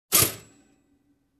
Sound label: Toast jumps out